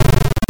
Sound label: lever